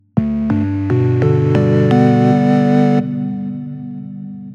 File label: Smooth Organ; jup8